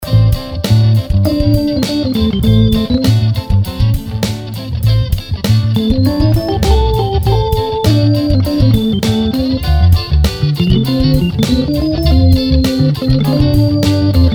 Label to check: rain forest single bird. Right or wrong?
Wrong